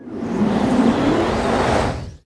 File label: throw